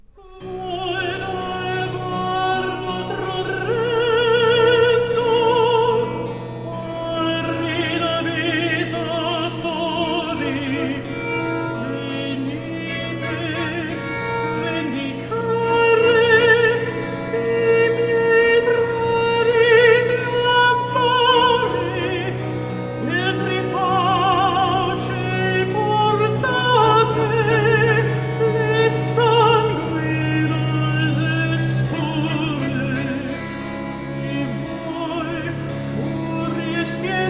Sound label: Cantata Medea in Corinto for alto 2 violins continuo - Recit con violini; Antonio Caldara